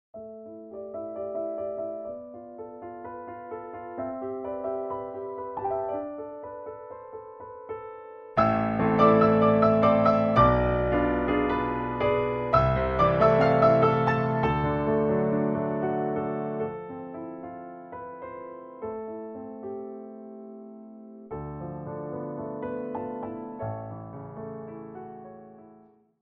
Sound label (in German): Feuer fadeout